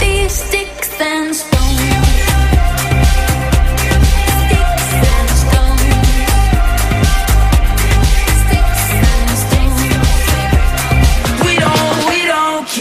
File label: hot electro ringtone